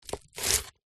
food onion raw skin on slice chop knife

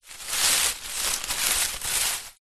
dried leaves rustle movement hand

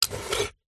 tomato slice knife wooden chopping board

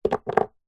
mouthguard gumshield set down table

